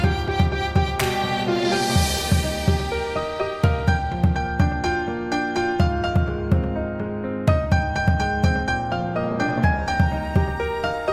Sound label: piano and violin cover